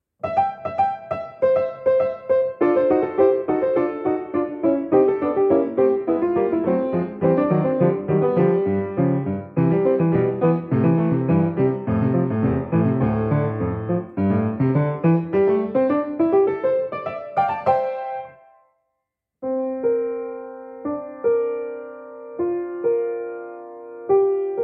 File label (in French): piano son